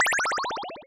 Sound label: score enemy